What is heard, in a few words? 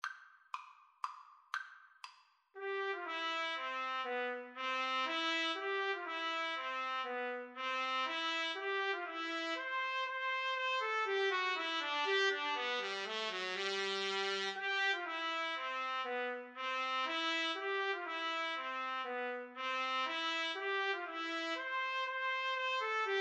trumpet cello, Trumpet-Cello Duet